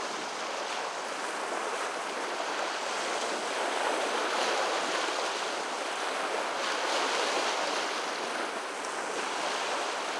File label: ambience water lake; Academy